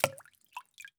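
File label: bullet case bounce 308 water; gmod; viper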